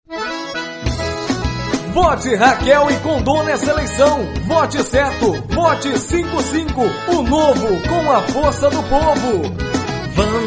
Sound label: jingle